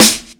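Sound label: sizzle snare a key, tuned to the A note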